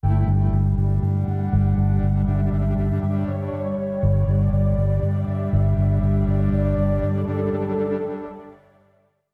Link Sting

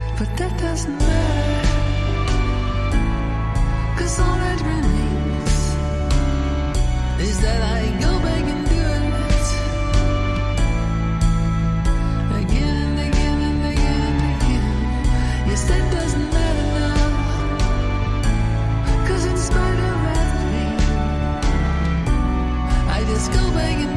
Orchestral

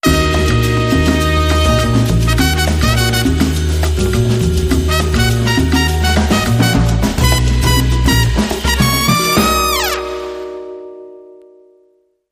Noche Cubana

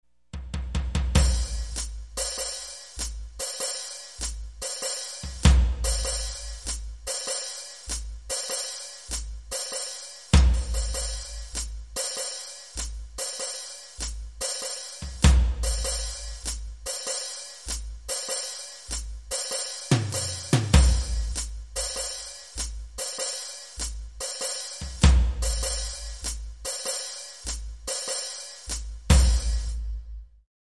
Stripper Burlesque Loop

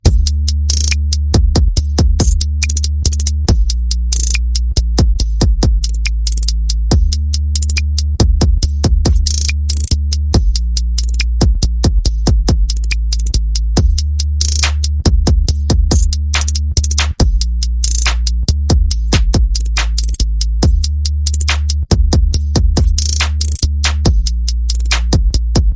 Bass and Perc Only

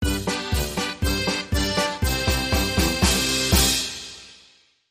Midway Polka

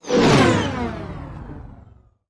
Space Ship By Fast

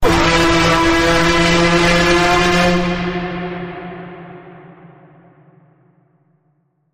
Full Bend Ahead, E

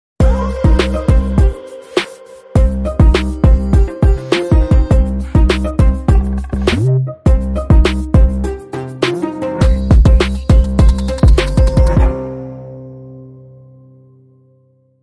Cello Chops, Bumper

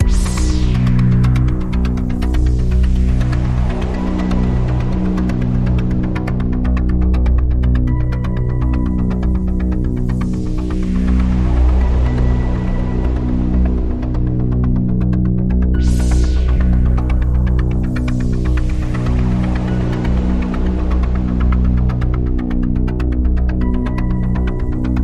Radio Toolkit Pad

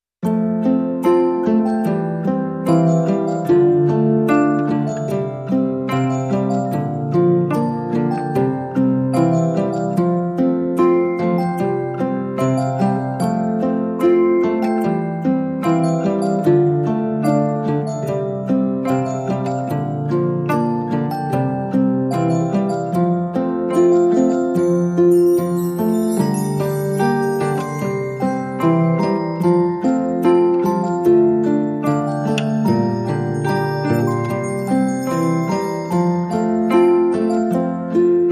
Cozy Time Guitar Harp Piano

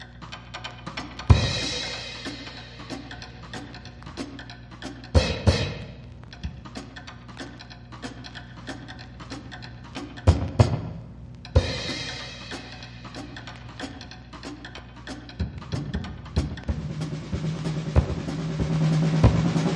Drums

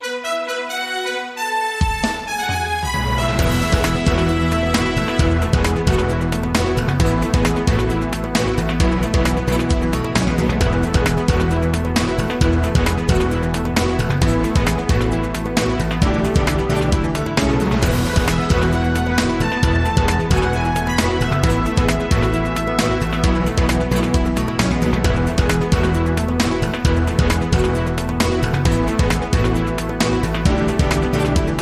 Breaking News Opener

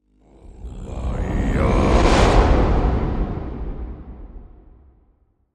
Viking Chant Whoosh

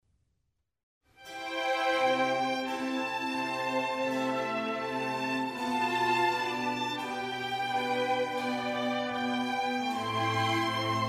Concerto for Strings in C major; RV113; Antonio Vivaldi

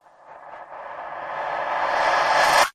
Quick Twirl

